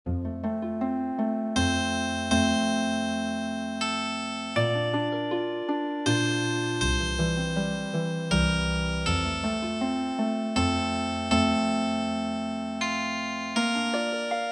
Healing Harp

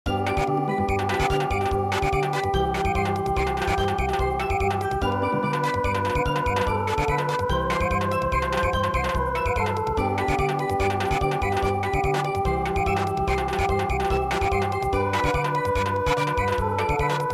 Samba

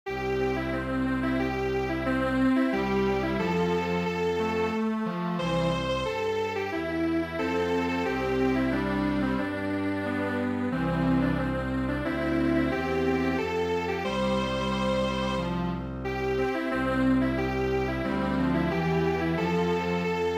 Strings Quartet; good bye

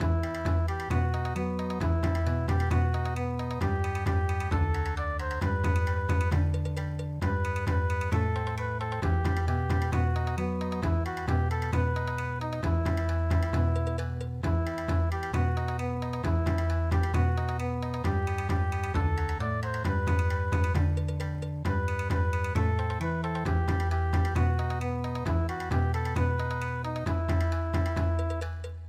Bon Odori

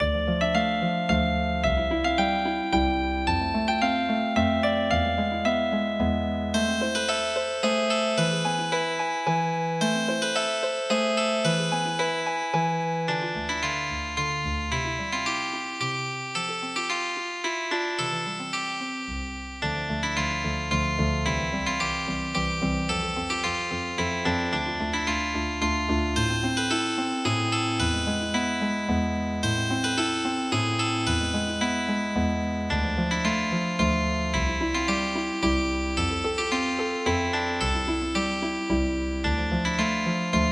Healing Harp, traditional

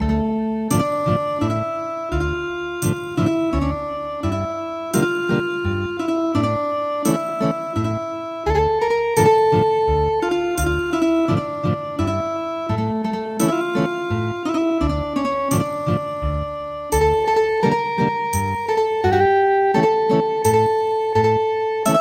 Ethnic Guitar, traditional; RUSTLE OF THE GREEN GRASS

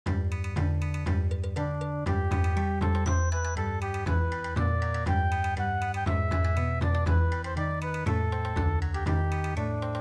Bon Odori

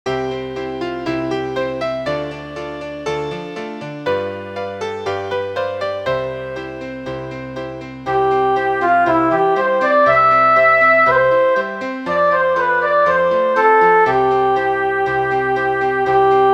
School Piano; midi; traditional

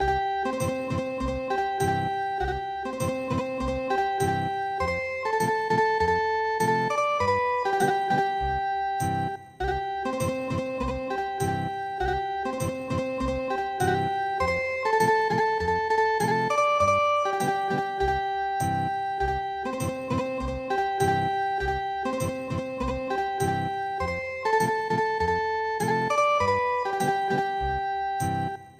Ethnic Guitar; English Coast Song

